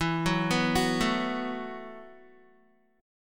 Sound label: E Suspended 2nd Suspended 4th, Guitar; arpeggiated; 4F,4A,5C,5G,5C,6E; 11,9,11,11,9,x; JGuitar